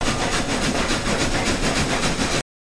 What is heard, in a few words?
Steam Loco Chuff, steam train